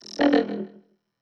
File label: Neo-Wave Vocoder, Pop Rock